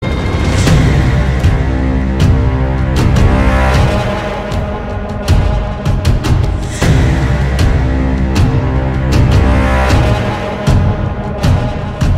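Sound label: Motion Poster background music; Acharya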